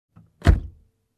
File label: Closing Glovebox